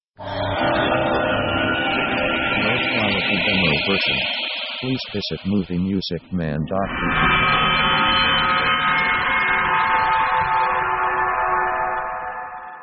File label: spooky scene transitions; Dark mood